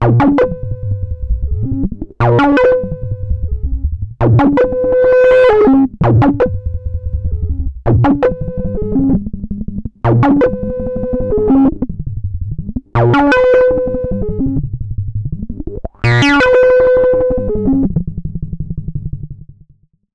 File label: Liquid Foam Loop; HerbsAndStones